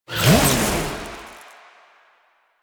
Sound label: Power Slide Start, Motorcycle; Motorcycle Ninety Nine; Bullet Jump